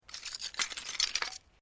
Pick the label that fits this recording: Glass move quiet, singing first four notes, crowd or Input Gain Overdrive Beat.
Glass move quiet